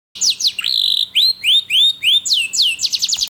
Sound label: Recording, bird